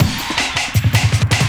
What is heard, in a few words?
Drum & Bass, 160 BPM